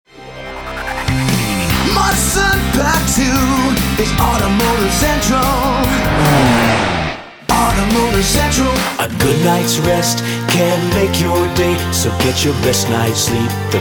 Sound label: Jingle Mix; Lionshare